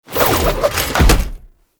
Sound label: Teleport End; Enemies; Abilities; Warframe; Tau; Dax Ninja